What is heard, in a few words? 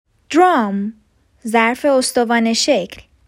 Drum